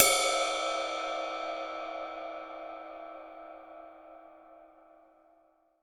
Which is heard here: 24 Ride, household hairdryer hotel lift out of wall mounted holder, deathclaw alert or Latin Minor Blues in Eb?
24 Ride